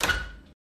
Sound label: Door Close Squeak; latches & bolts